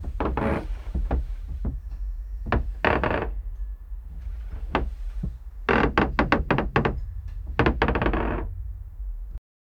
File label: sound of a ships wooden, nautical